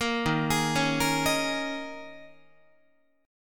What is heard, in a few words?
D sharp 7th Suspended 4th, Guitar; D#7sus4; arpeggiated